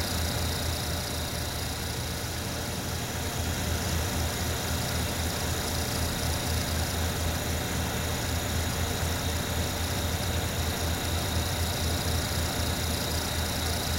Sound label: Pump Working Loop; Psychofluid Pump; rimworld